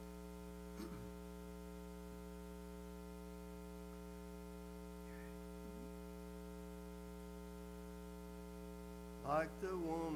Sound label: singing Family